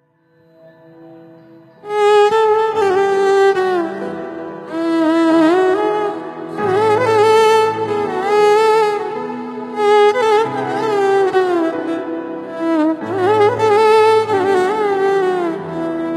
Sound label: mother tamil bgm ringtone